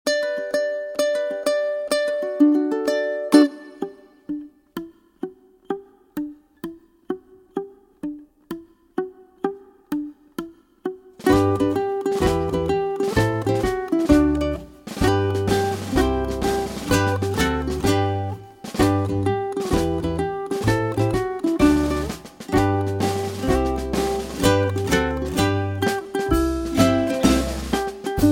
Ukulele

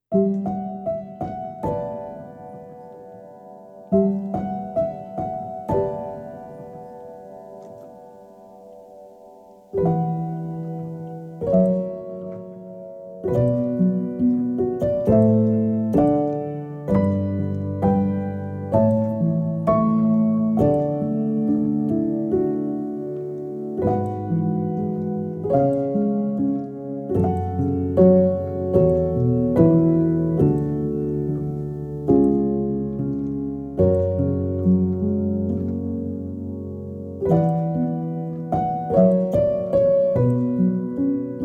Piano Version; Howl's Moving Castle

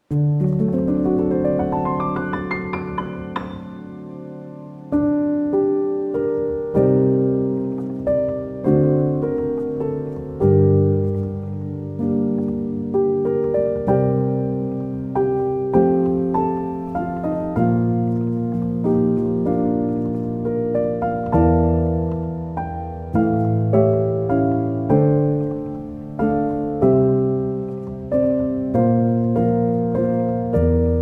Piano Version; Howl's Moving Castle

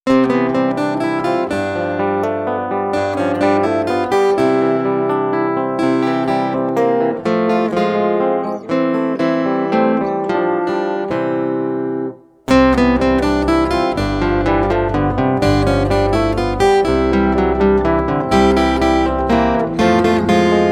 Instrumental

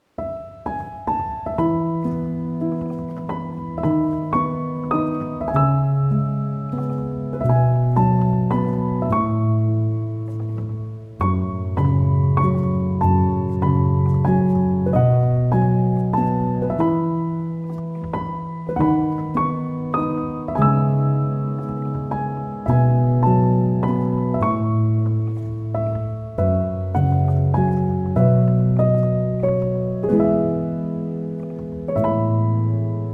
Piano Version; Grave of the Fireflies

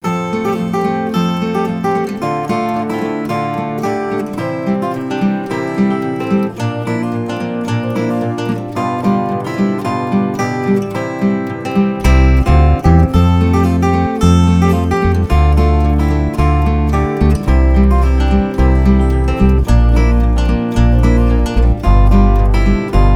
Instrumental

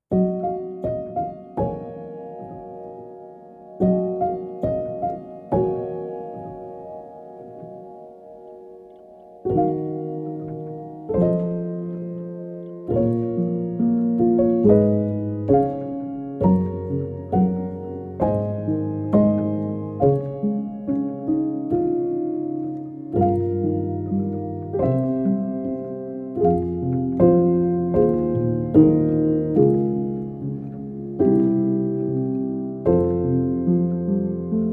sleep piano; Howl's Moving Castle

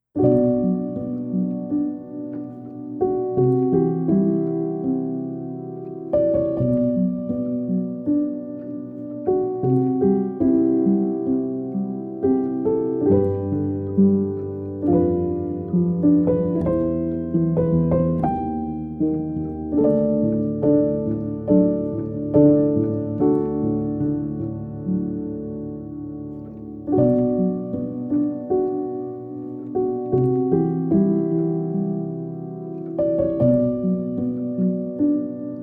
sleep piano

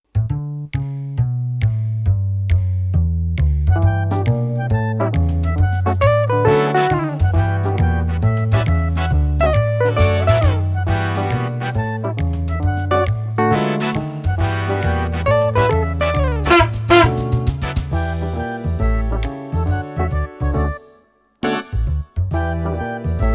Instrumental

